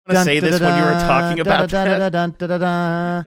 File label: singing studio fanfare